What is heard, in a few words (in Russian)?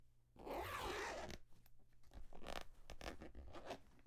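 звук застегивания молнии на рюкзаке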